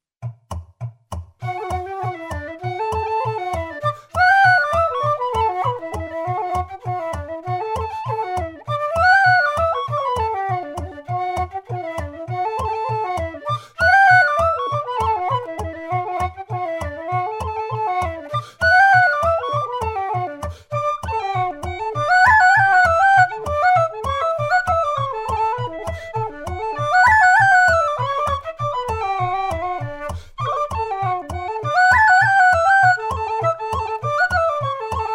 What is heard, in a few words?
whis flute bodh fast; wisemaid